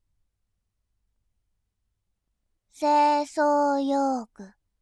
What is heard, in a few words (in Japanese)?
清掃用具, ASMR